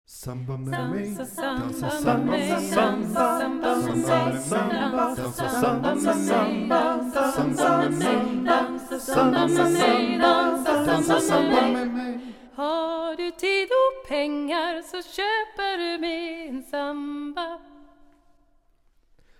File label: samba